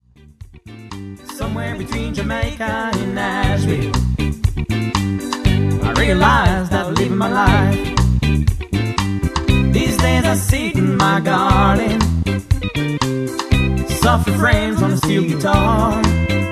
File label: reggae